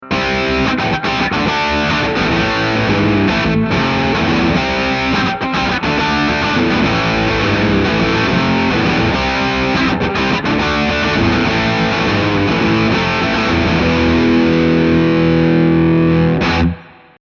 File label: Rupert Neve Designs 511 Guitar Amp Beyer 260 NCS more Gain less Output Silk Full